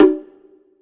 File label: SR H CONGA, Drum Mashines, SR-16 DRUMS; EdgeSounds